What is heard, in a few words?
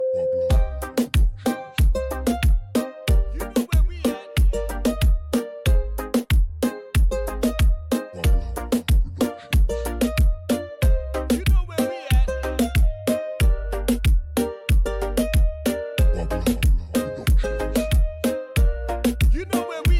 Latin Reggaeton